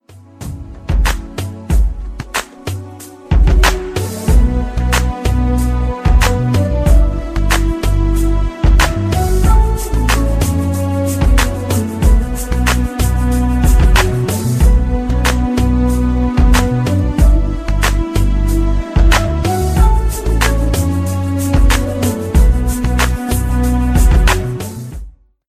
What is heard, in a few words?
soul ringtone